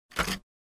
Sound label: Machine Loose Handle